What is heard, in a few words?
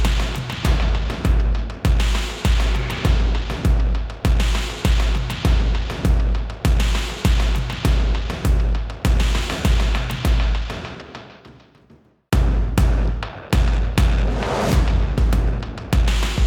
PVP Game Start A